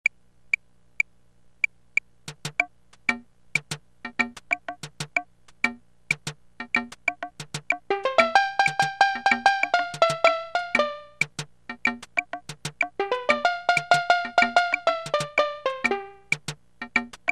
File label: Calypso